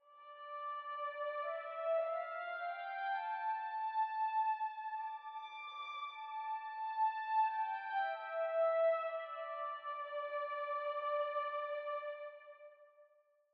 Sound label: Violin melody; Oknytt; Näcken plays